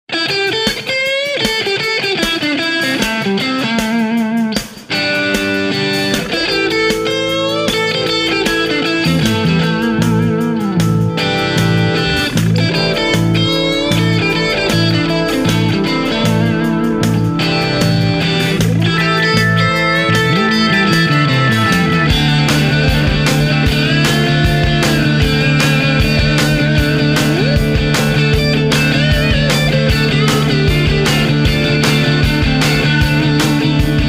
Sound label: Stadium Jam